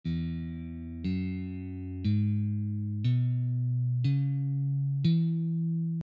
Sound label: Beginner guitar scales